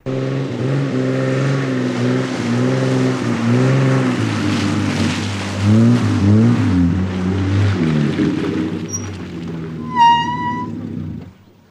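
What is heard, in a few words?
Land Rover Stop Off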